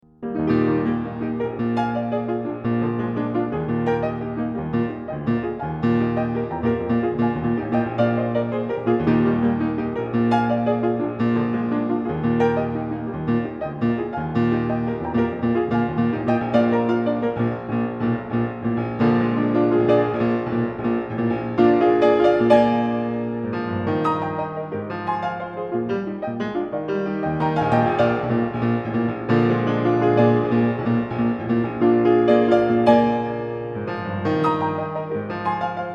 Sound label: 15 Piano Variations and Fugue in E flat